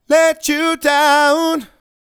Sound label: Let You Down, Male Vocals